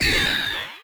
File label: screams alien